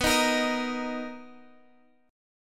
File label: B Major 7th B down; strummed